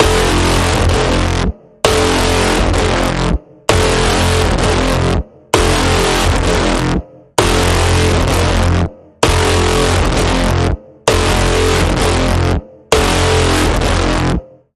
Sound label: Pulsing Code Generator, F